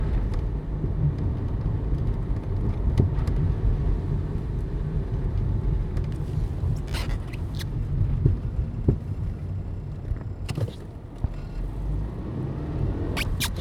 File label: bump noise, suspension; honda crz